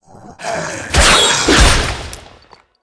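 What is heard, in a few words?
ant attack, monster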